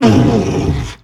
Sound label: attack claw; Thalassophobia Restored; Humanapostle